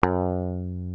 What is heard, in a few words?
GTR EL GTR, Clean E.Gtr